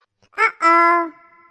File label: Oh Ohhh